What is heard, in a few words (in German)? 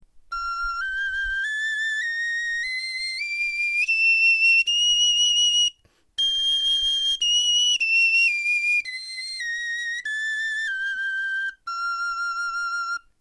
tonleiter, knochenfloeten